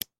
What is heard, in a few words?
click